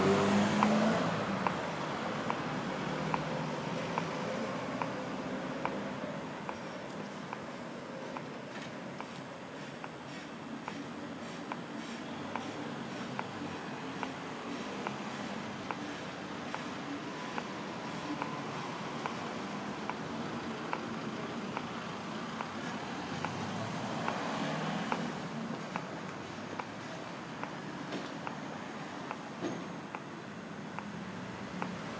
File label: pedestrian crossing clicks, berlin